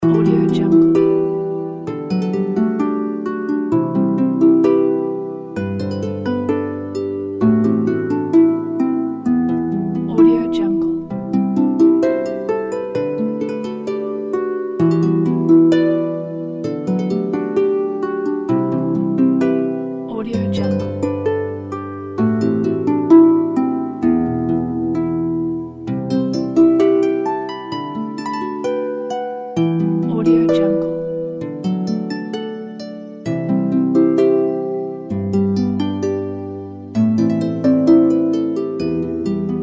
magic forest

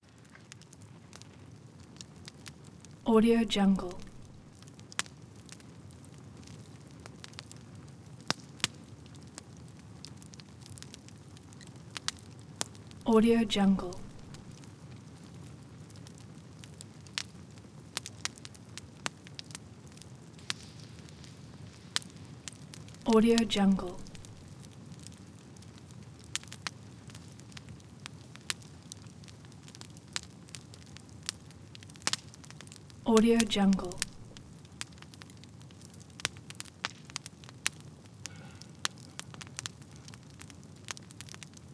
fire crackle burning wood big snaps; prosoundfx

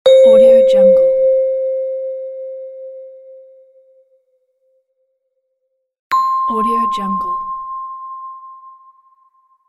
bell button

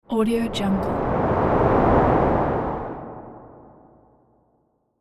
wind swoosh

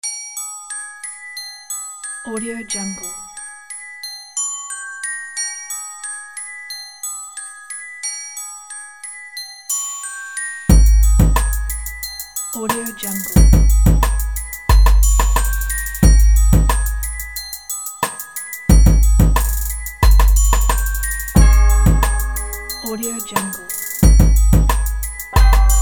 scary doll; splashkabona

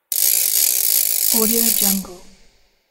windup toy

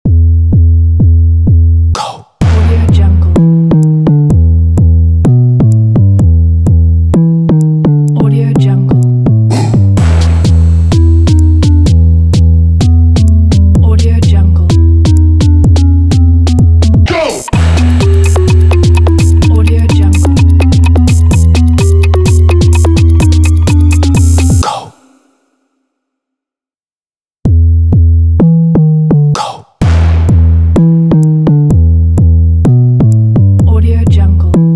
808 urban motivating stomp

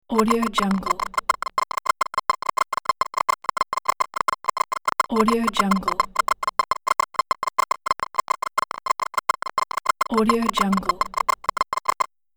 digital interface text readout

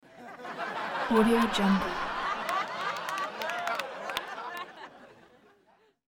studio crowd laugh sparse applause

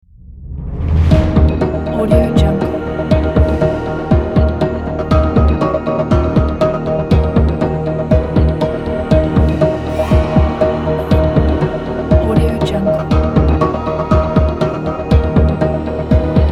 quiz show suspense timer

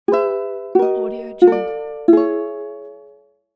ukulele fail

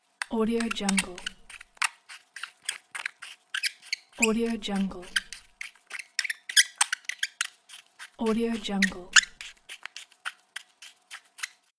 metal screw squeaks

